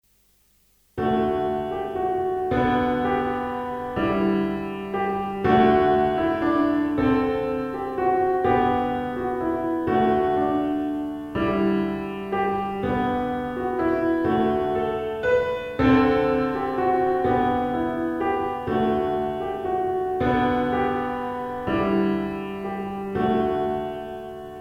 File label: piano pop